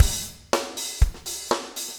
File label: 4 on floor 120, loops, 120bpm